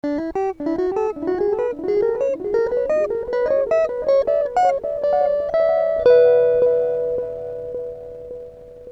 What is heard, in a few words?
Rebote Delay, guitar; guitar - effect - sound card.; Recorded by A. Colman